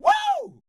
perc voice, Roland MC-909

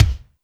kik The Thud, Alesis D4; drums

